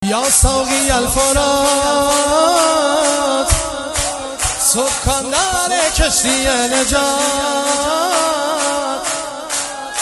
مداحی

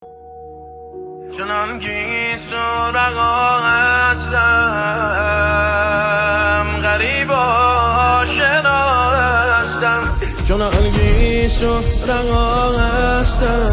مداحی زمینه

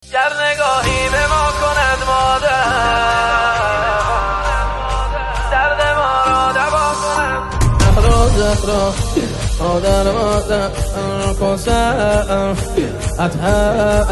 مداحی

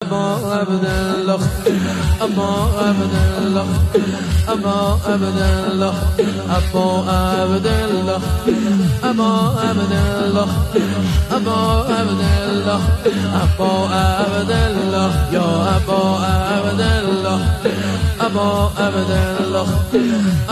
مداحی ذکر; اباعبدالله

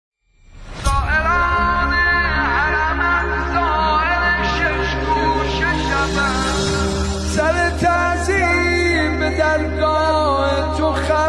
مداحی

